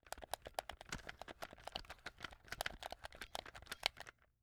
Technology Joystick Button Pushing And Stick Moving